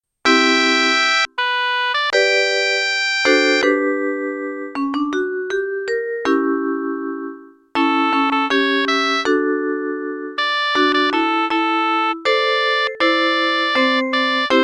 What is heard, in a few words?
Jazz Classic Finale